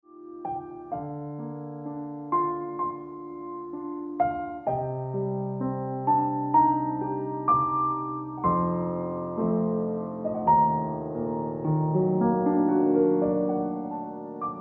Piano Music

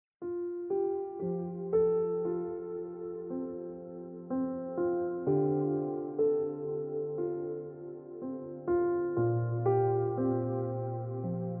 Ringtone Piano Music

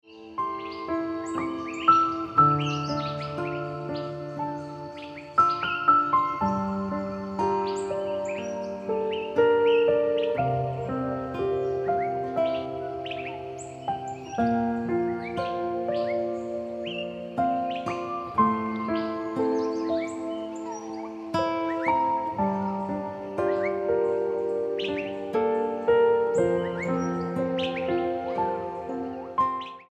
Ringtone Piano Music

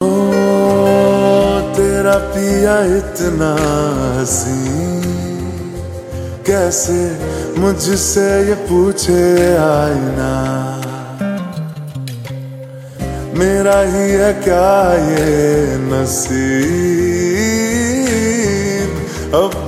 Lofi Slowed Reverb Ringtone

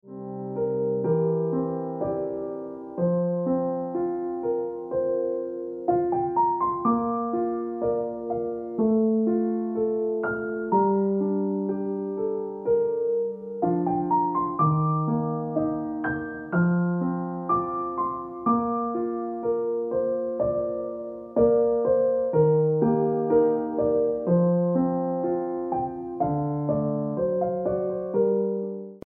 Ringtone Piano Music

